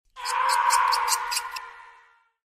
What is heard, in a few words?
scream